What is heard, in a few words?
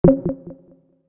Short Multimedia Alert Effect, Chime; Alert asterisk; Chimes; Stuart Duffield